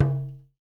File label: ETHNIC DRUMS; Spectrasonics; Liquid Grooves 1 & 2